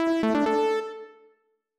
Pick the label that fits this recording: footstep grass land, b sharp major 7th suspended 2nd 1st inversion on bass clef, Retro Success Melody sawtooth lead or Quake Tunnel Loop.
Retro Success Melody sawtooth lead